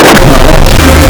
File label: Engine Blues; lolicore